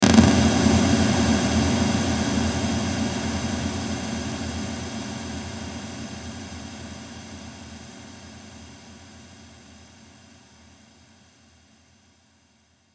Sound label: Hit Static Reverb Tail; Blastwave